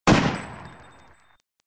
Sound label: gun; mcheli